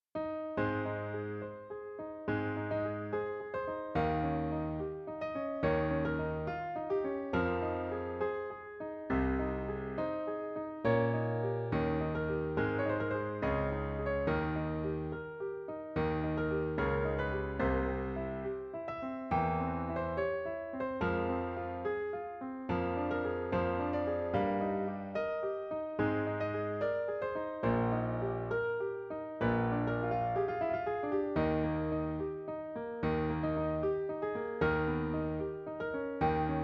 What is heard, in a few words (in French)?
Piano Seul; Nachruf Oder An Sie; Ludwig van Beethoven